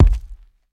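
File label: Rock Dirt Heavy Dead Thud; rocks & dirt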